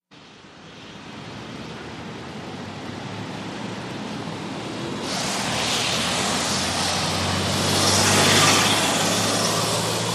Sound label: Busy traffic on wet road.; TRAFFIC WET TRAFFIC: EXT B/G